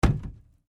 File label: Cardboard box hit; Material components for constructing more complex sounds; Materials